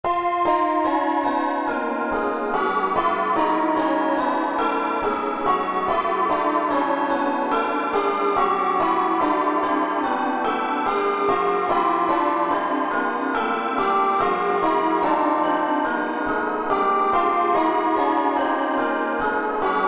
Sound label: barberpole bells